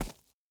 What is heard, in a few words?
boar footstep dirt hard; Rust